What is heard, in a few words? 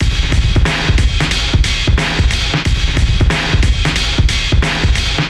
HipHop Rock Beat 90